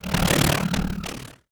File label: wing; smite; khepri